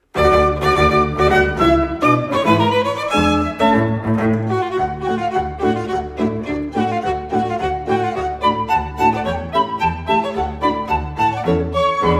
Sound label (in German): Marsch; Radetzky Marsch